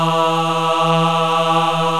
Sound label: MALE CHOIR